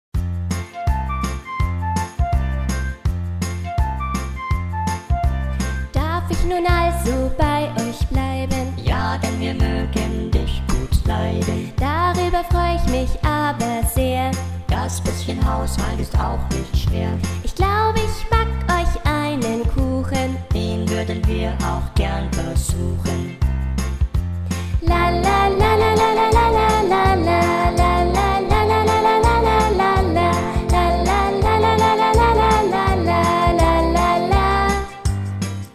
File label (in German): Zwergenlied Reprise Vollplayback; Schneewittchen